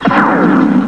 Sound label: Explode; Querkz 1.5